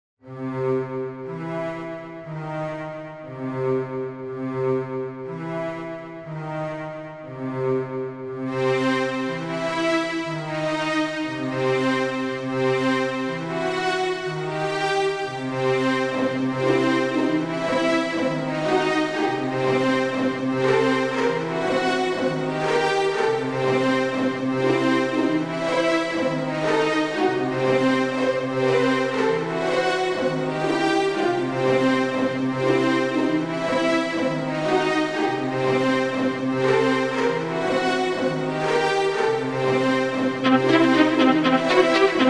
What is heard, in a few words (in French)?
violon